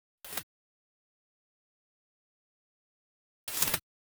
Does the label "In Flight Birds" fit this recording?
Yes